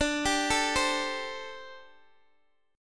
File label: D 7th Suspended 4th D Guitar Open G